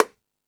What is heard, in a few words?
Latin Drums, E-MU Pro-Cussion